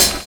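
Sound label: SAX N GUITAR; Univers Sons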